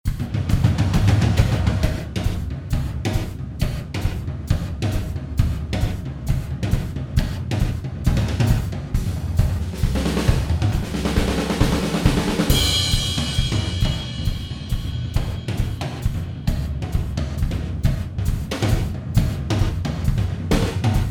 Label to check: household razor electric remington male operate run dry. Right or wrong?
Wrong